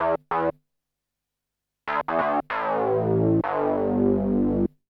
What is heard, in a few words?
SYNTH 96 G; old school